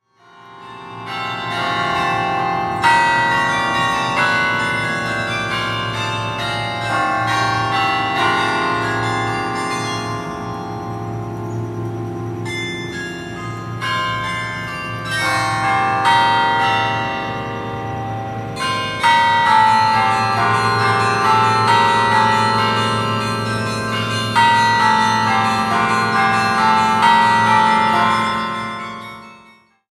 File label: carillon; Range: 3.5 Octaves; Oklahoma!